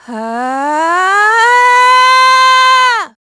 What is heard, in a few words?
Casting, Vox; Ripine; kings raid; en